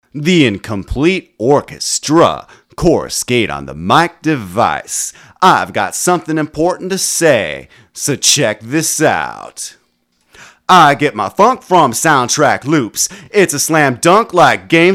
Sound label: Sound Track Loops 90 BPM